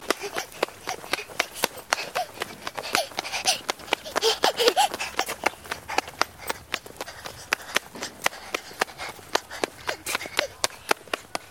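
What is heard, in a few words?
running girl; beg devochki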